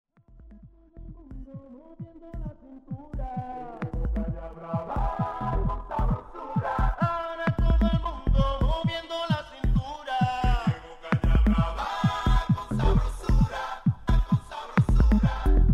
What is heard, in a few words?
live open air; vogelwoog; kaiserslautern